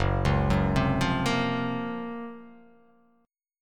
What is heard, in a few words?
Gb Major Sharp 11th chord; GbM#11; arpeggiated; JGuitar